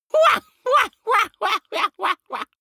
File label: laughter gloating; vocals; comedy-cartoon; soundpool